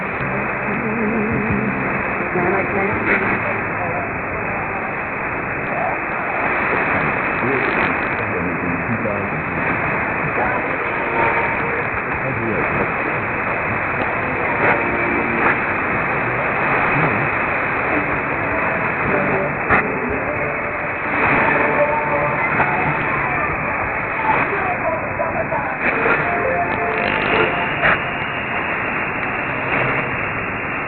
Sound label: 6281 kHz